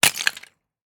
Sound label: Ice Break; Inmotionaudio; Freesamplesunday